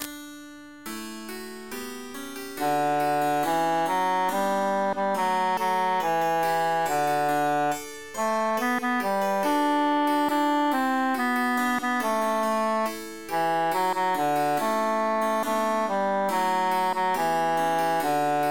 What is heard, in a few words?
tenor emphasized; non nobis domine